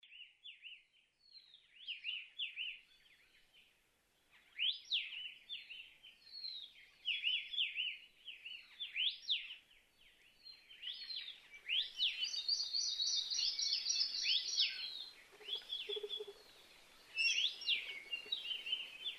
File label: Native Bird Soundscape; Voices Kauai